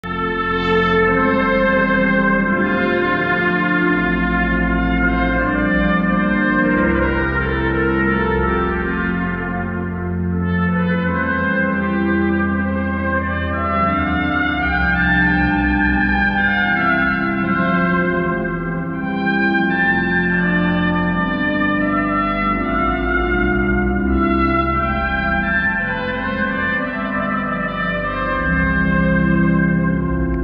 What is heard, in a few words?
Organ and trumpet